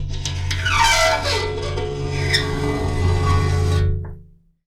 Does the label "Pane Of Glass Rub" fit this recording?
Yes